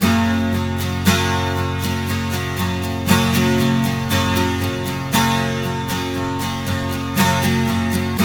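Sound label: 12 string major G, guitars; Spectrasonics; Hans Zimmer Guitars VOL-1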